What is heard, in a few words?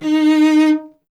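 strings cello D#; Miroslav Vitous; Solo Instruments VOL-1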